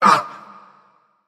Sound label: nv breath; smite; xbalanque